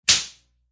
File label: Metal Hit; Multimedia